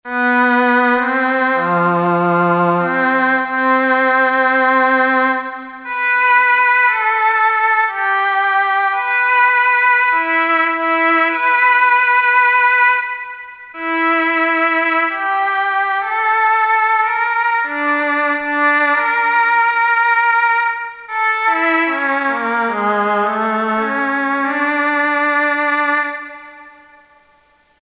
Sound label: Oboe, Korg DSS-1; Woodwinds